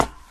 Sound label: metal, Footsteps; Barotrauma